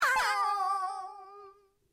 トホホな感じ

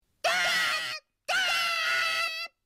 電気バチバチ

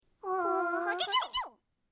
ウグイスのさえずり; 声マネキング; 声マネキング対決; フブみこさん; ホロライブ; さくらみこ

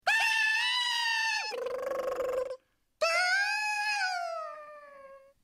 トンビの鳴き声

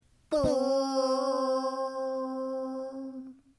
お寺の鐘

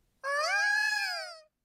インドクジャクの鳴き声; 印度孔雀的鳴叫; 声マネキング; 声マネキング対決; フブみこさん; ホロライブ; さくらみこ

